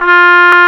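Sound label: Trumpet mf F2; Digital Sound Factory Virtuoso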